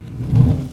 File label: invis